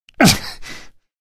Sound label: monk pain; Source Engine; video game; ravenholm